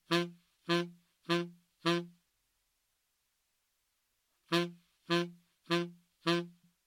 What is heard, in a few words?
upbeat downbeat example, saxophone